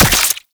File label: Brood Spider Attack; Warframe; Oraxia